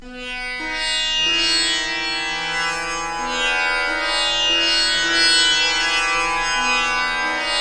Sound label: Patdip, Far East Drones and Plucks